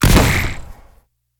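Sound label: dark fire, impact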